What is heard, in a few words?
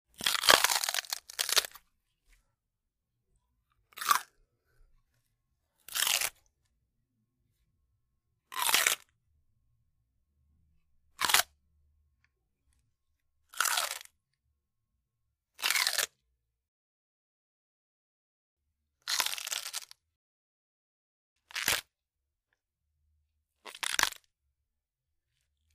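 biting crunch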